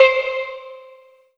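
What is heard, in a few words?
Kawai K11 Steel Drum C5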